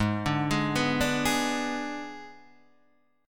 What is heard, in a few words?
G sharp Diminished G sharp; G#dim Chord {4 5 6 4 3 4} arpeggiated